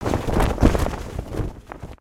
Flag Flap; ninjago

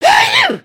sneeze

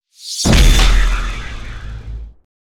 Flashbang; Flashbang With Lead; aronwk collection; ndaudio

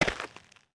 Foot Dirt Sneaker Walk, footsteps

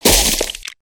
Crates n Boxes, destructibles

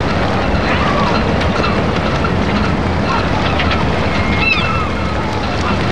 ocean light loop

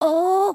need to pee; emotes

